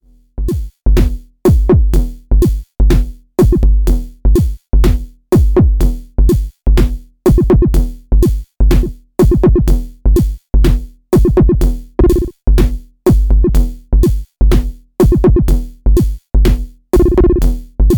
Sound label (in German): Drum Eurorackmodul Unabhaengiger Pitch ueber EG; Erica Synths LXR